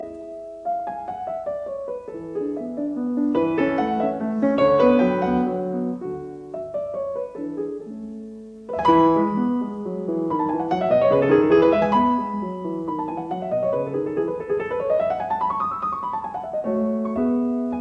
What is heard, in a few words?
Piano Sonata No 11 in A major K 331 II Menuetto Trio; Lili Kraus